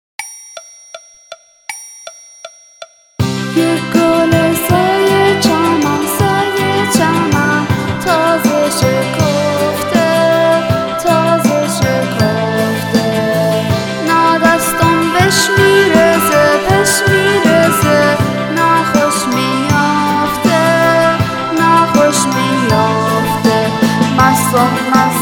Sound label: Vocal